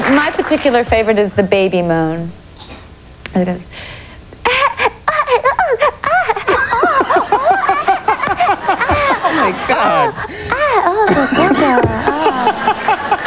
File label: baby moan